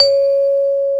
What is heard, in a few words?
C#4 vibe, vibraphone; InVision Interactive; Lightware VOL-1; Instruments & Percussions